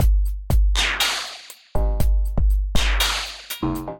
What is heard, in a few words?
Kit, Drums Synthesized; GrainBeams Kit; Ableton; Factory Packs; Drum Essentials